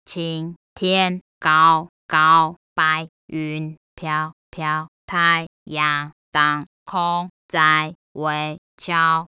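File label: Happy song, Mandarin Singing Voice Synthesis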